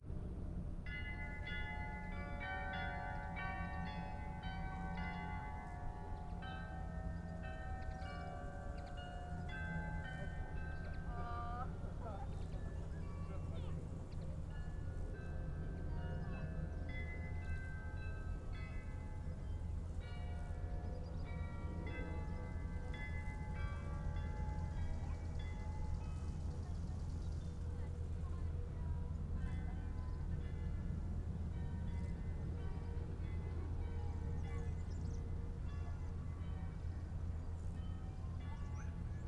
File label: amb town vineyard distant glockenspiel walla; sonniss gdc